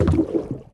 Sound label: Monkey Footsteps Water; BTD6; Legends